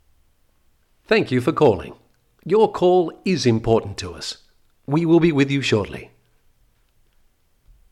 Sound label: On hold